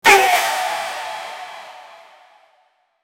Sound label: full auto rifle; automatic rifle